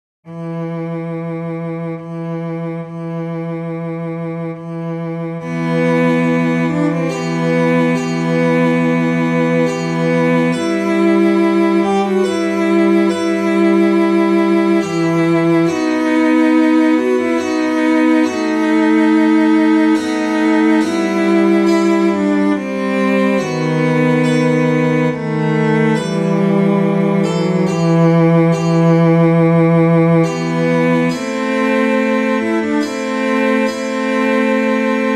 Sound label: Cello Sonata; Vivaldi; Cello Sonata No.5 Ⅲ; チェロソナタ第５番第３楽章（シチリアーノ）